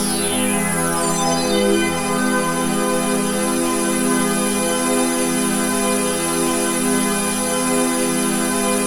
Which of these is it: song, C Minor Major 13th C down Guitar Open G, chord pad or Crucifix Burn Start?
chord pad